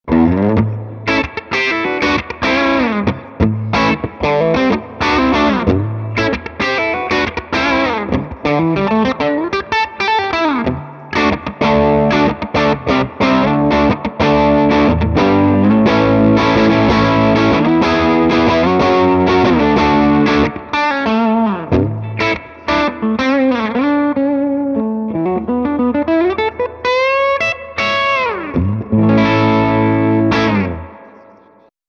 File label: retro 30 overdrive; lonestar